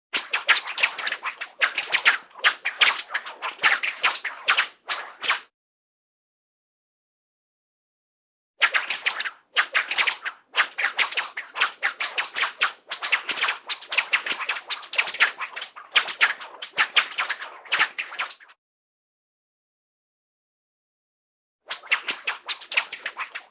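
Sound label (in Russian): свист кнута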